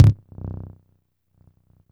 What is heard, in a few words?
FART 808; Spectrasonic Distorted Reality 1; PHUZZ DRUMS